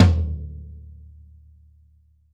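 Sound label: floor tom; drum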